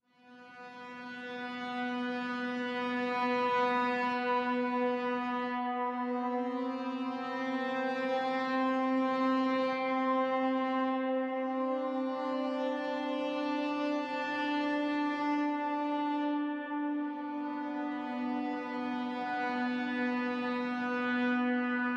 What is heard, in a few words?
Elevator Opens; Remember Me